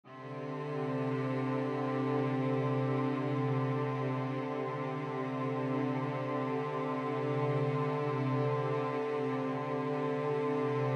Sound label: String Ensemble, 2nd in C